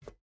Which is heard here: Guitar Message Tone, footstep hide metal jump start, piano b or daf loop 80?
footstep hide metal jump start